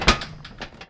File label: metal grate, footsteps; player